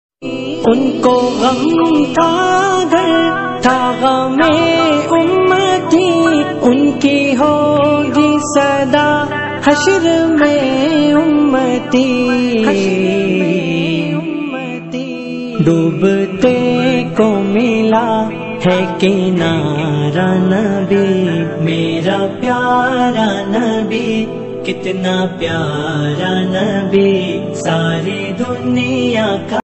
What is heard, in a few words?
beautiful islamic ringtone naat ringtone arabic ringtone